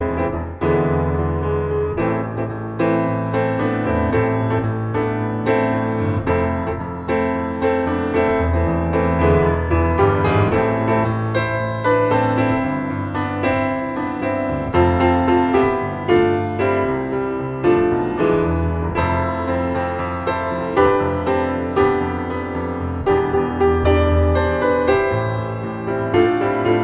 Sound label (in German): ueberleitende improvisation am fluegel